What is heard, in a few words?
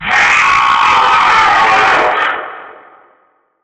jumpscare sound; fraf 2